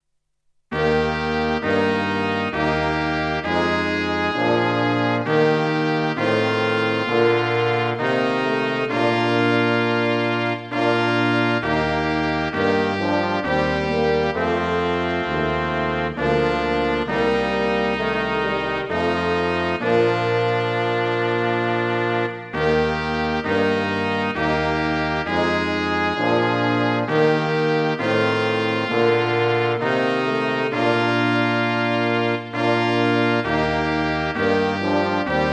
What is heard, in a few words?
Chorale